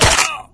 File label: damage, player; Source Engine; cstrike